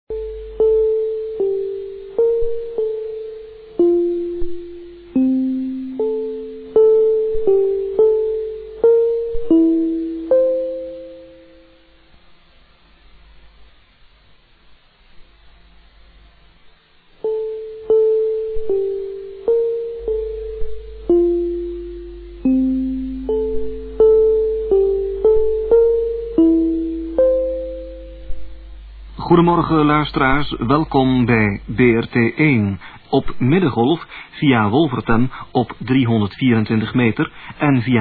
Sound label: interval signal; brt1 1977